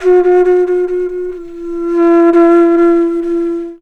FLUTE D, Indian Traditions

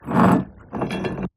Stone on Floor; neuro; InsideInfo; Real World Sounds

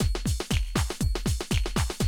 120, Classic Drum Loops

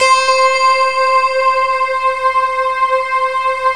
SYN, Roland, SYN DIGITAL; Sound & Vision - Gigapack I CD 2

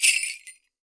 skranz, WORLD PERCUSSION; Best Service ProSamples vol.52 - World Instruments 2; MIXED PERCUSSION

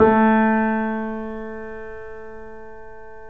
3D Pianos Yamaha C7; E-MU Producer Series Vol. 5

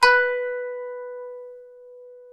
KPES B4, KANUN; SoundBytes; Early Patches; KANUN SMAL

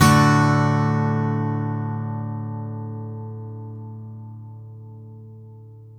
MAJ D UP, CHDMAJ, Blues Guitar Samples; ILIO; Fretworks

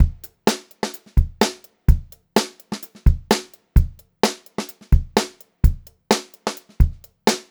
128 funky beat, funk groove drumloops; USB Soundscan vol.38; AKAI

